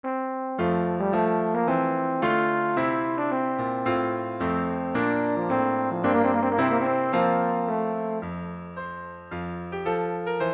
trumpet tune, euphonium

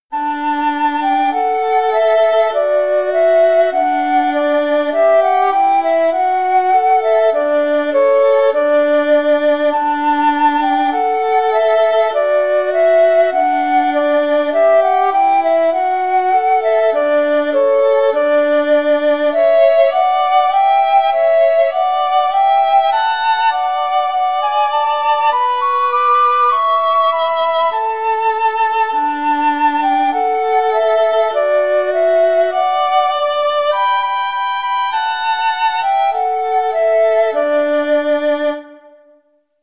violin flute, duet; deck the halls

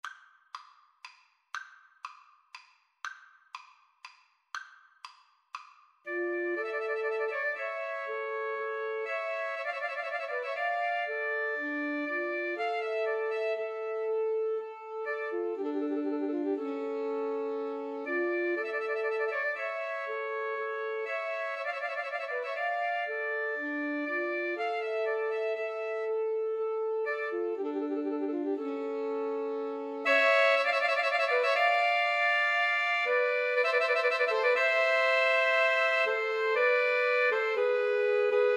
pastorale Alto Sax trio

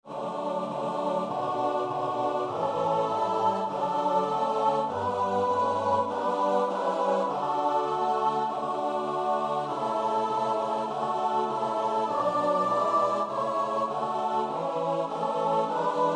choir; holy holy holy

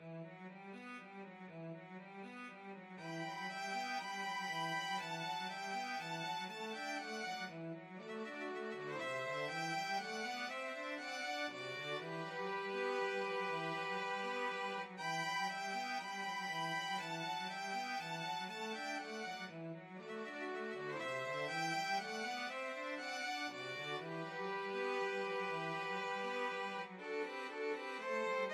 Spanish Dances NO2, 2 violins cello

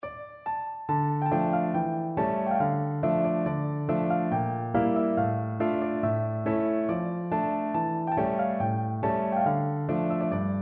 lully gavotte, piano

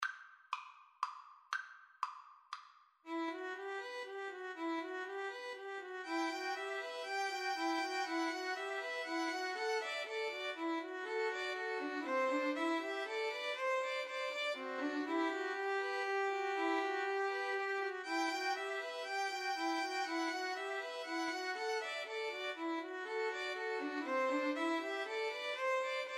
Spanish Dances VLN trio

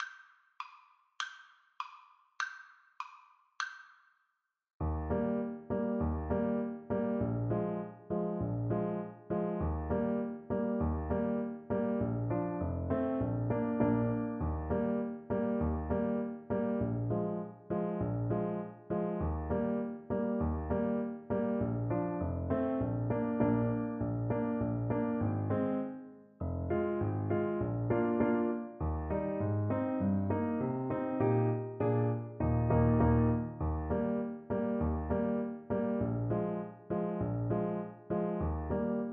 trumpet hornpipe

